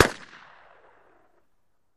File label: Gun Shot Sngl Fire